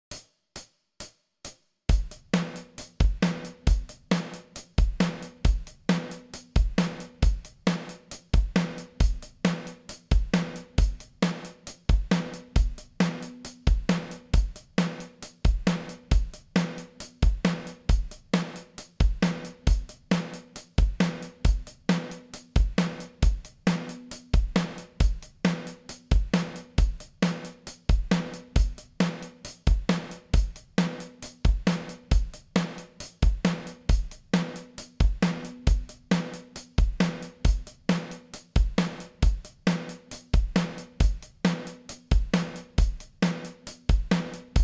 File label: 135bpm, bass